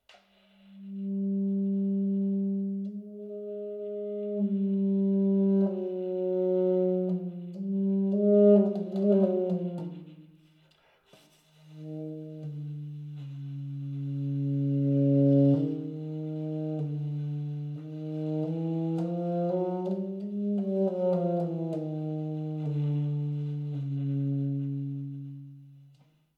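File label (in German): alt, Subtone, Subtone als pp-Spiel im tiefen Register. Alt; saxophon; spieltechniken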